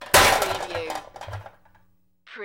Metal Medium Impact Settle; rattling

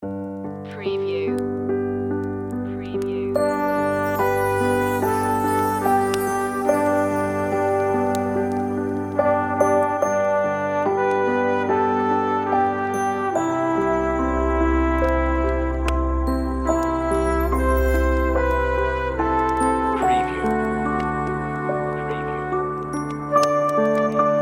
Jingle Bells Relaxing Christmas, on-hold music; MediaMusicNow

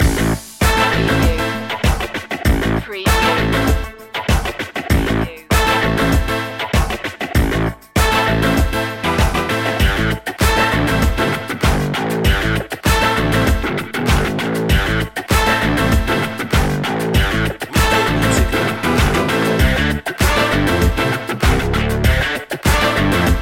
blues funk fun loop; funky groovy

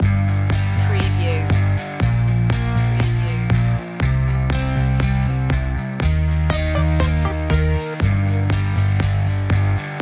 feelgood pop

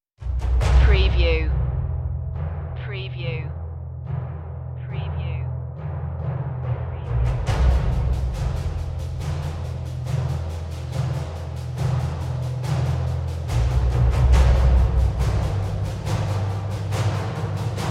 apex perc, war music

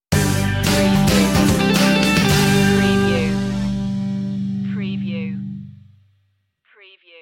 shopping centre stinger; whistling

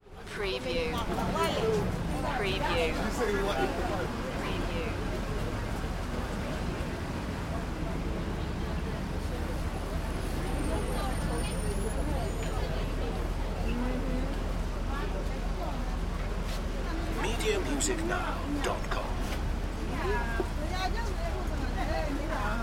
NYC Chinatown, New York City Traffic

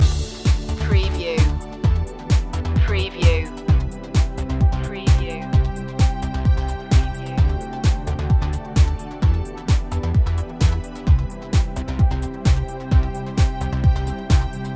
loop

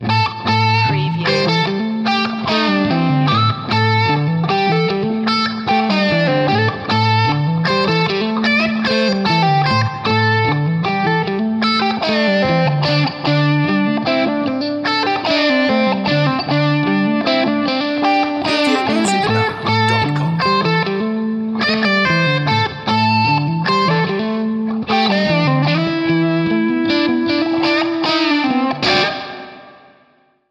rough guitar; blues; electric

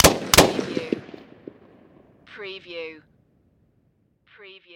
Machine Gun M16; warfare

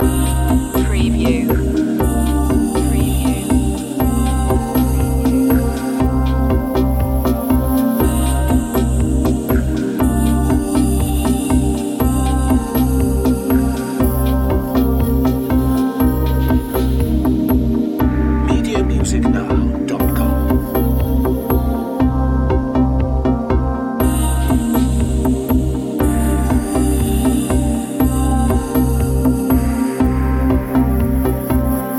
downtempo sunset loop; electronica

